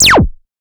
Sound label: MS20 Short Zap Thump